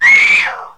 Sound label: female shriek; witch; npc; attack